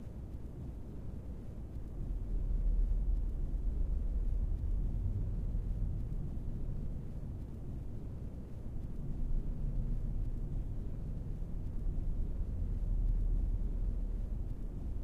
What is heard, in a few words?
Ambience